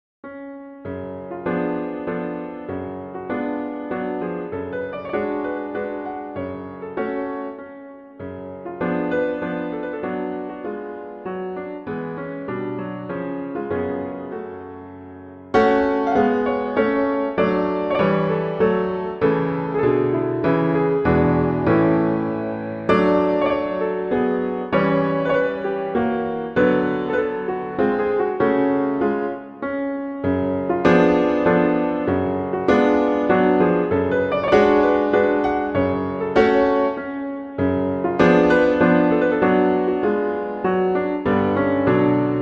Folk Song; Grieg; Lyric Pieces

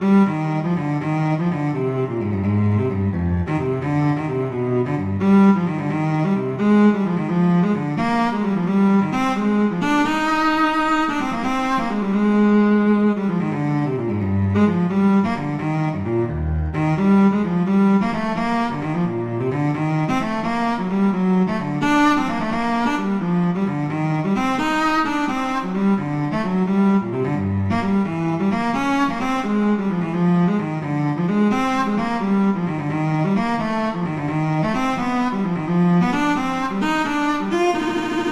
Gigue; Bach; Suite 5

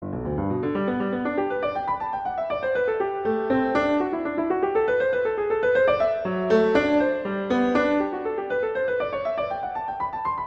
Prelude II - G major; Clementi; Preludes

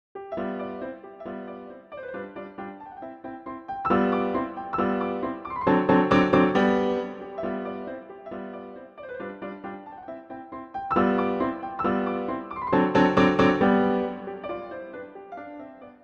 RONDO, Allegro; Sonatina; Diabelli

